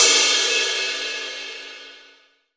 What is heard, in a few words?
drum crash g sharp key